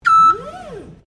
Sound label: FORKLIFT HAND TROLLEY LIFT OPERATE STEREO, Hand operated fork lift trolley; Construction Vehicles